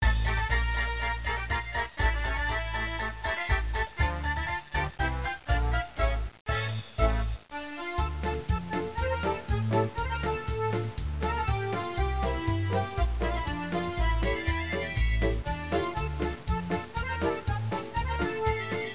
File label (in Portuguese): trilha; GEOGRAFIA